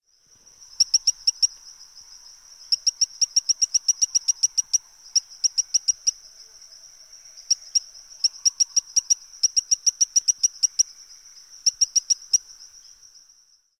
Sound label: Colosthetus Brunneus, amphibia